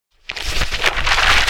Page turn

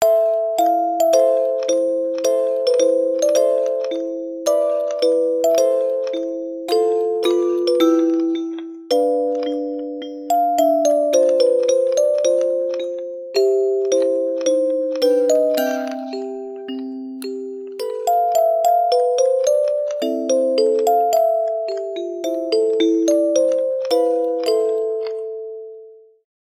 Music Box Toreador March

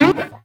Jump Bounce

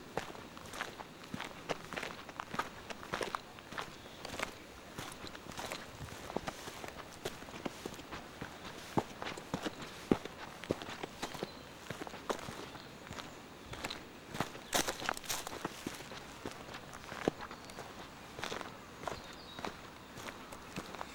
Saltwells Nature Reserve Walking On Gravel

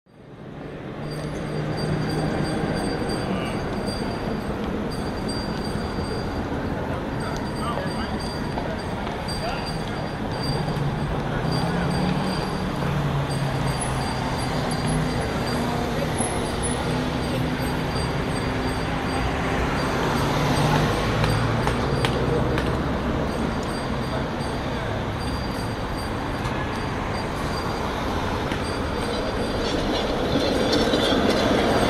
Market Montgomery Sanfrancisco 5pm

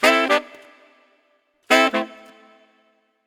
140 A min Sax; Zulu

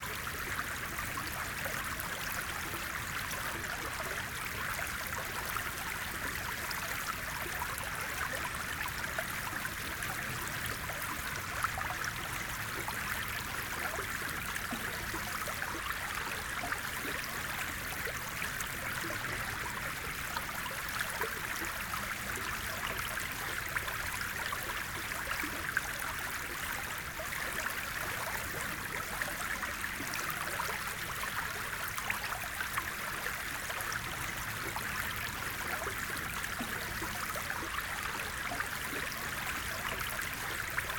River Small Stream Running High Detail Movement

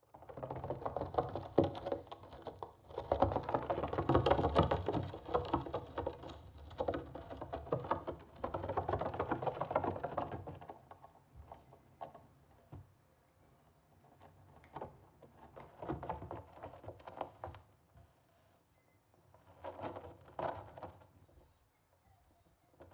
Rat In The Attic Possum In The Roof

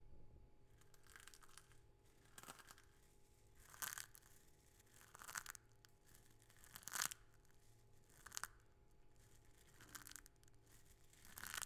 Gravel

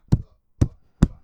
Three Bass Thumps

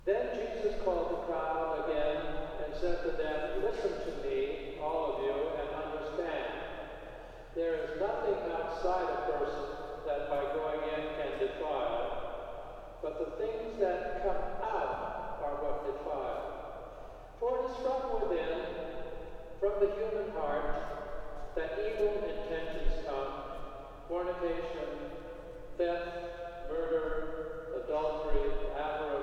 Memorial Church Gospel

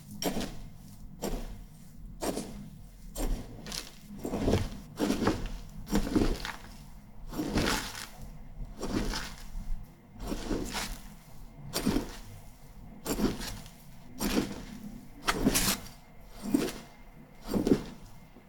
Digging Into Soft Soil With Small Trowell

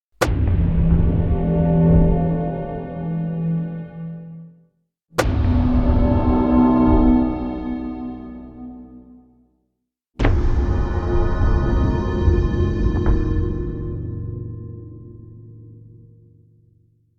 Hit Stinger Creepy

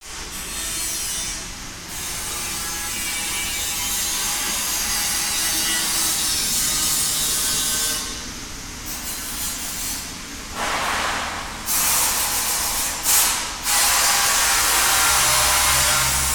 Welding

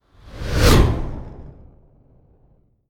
Fantasy Whoosh Ghostly Fast

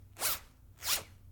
Zipper Fast

